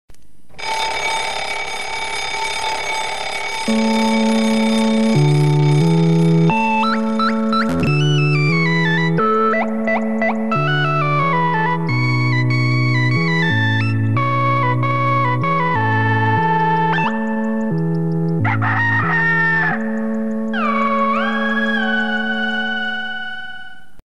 retro bulgarian wake

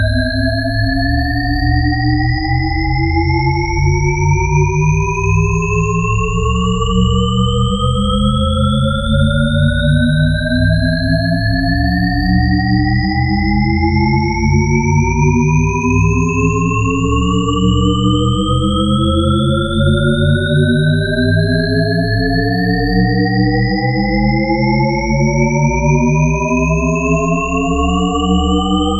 Shepard Tone

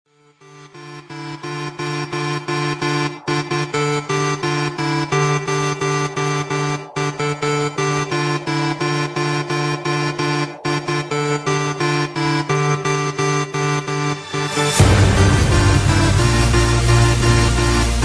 electro house mix

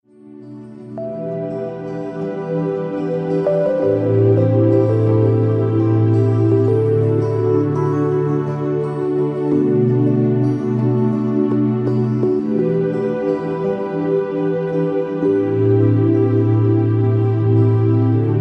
Smooth Nokia

